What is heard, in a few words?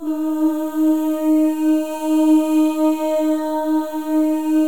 MOVING V1, Symphony of Voices; Spectrasonics